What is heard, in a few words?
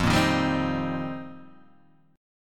F 7th Suspended 2nd Suspended 4th F Guitar Open D; 3,1,1,1,3,1